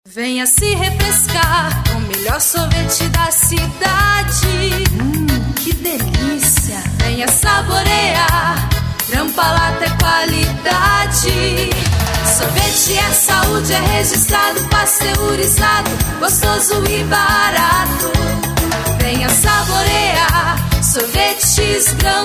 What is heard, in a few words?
jingle; GranPallatto; comerciais